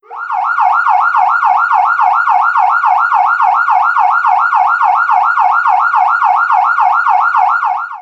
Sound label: yelp, sirens